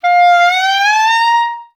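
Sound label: glissando F4; Best Service; Peter Siedlaczek's Advanced Orchestra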